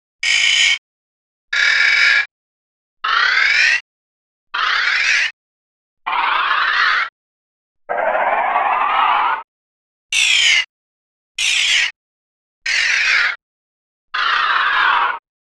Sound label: Metal Spoke Creaks